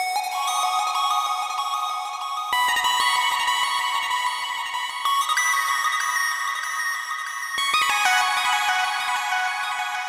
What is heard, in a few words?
reverb arp